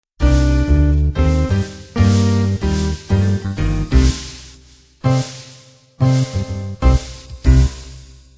jazz quintet end

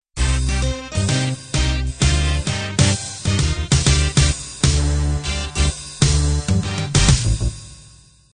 swing band intro

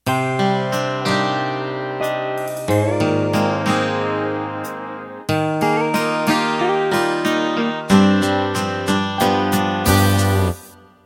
Country Ballad Intro

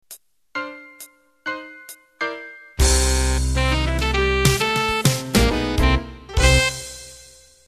Club Jazz End

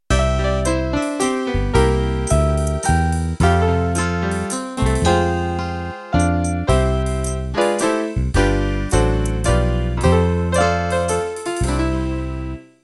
Country Waltz end

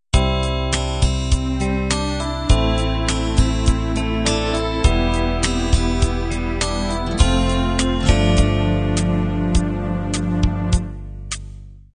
pop ballad intro

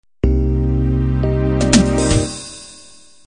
8bt analog intro

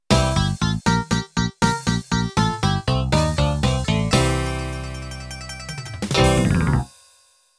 Country Rock End

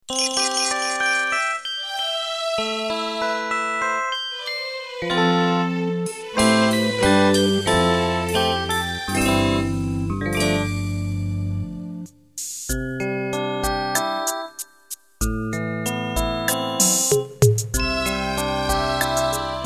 Animation Intro

